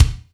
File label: Kick